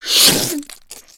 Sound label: smoker launch tongue